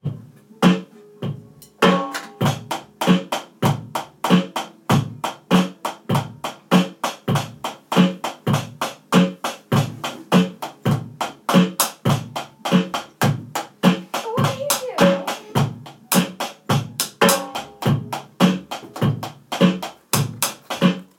live drumming performance

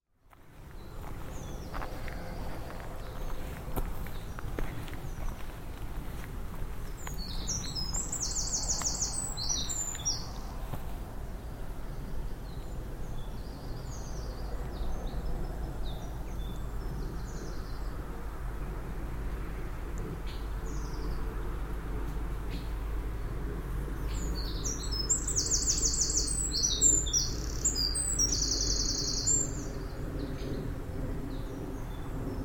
dawn chorus midsummer; ipsden

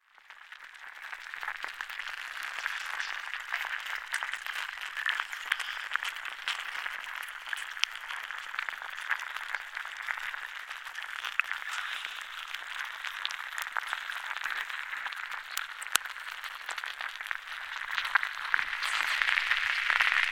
cracking ice at fachwen pool newtown

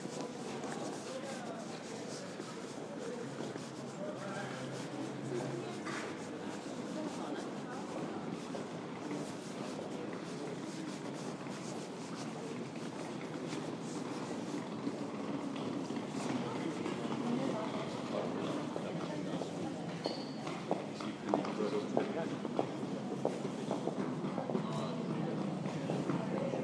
traffic free strolling copenhagen autumn evening

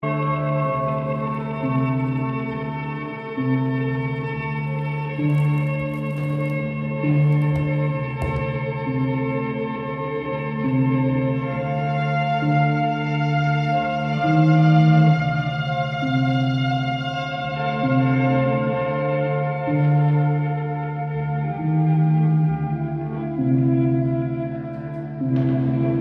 helicopter quartet play while the pa explodes

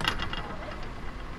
bus station door

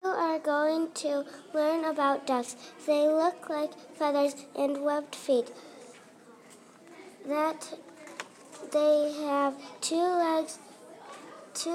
ducks

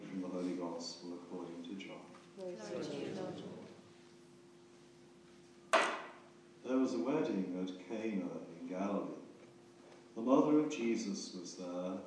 gospel

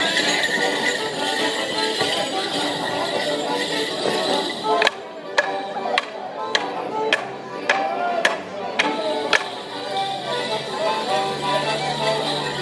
bouncing bells morris in bromyard square

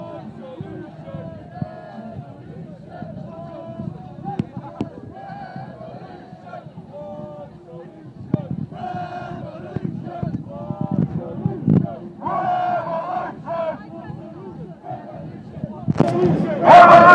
synchronised chanting

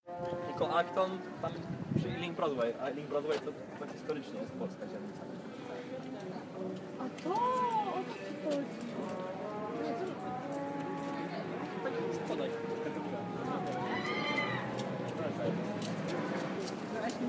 out of tune trinity college greenwich london

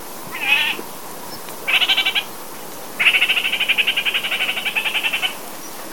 kakariki red crowned parakeet contact call

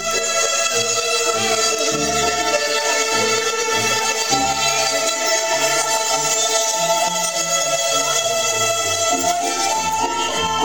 violin

